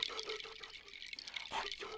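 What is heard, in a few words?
Music Village Calm Near; from dust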